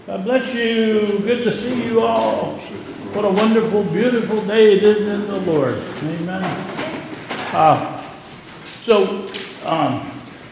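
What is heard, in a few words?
Early Service, Chatter, church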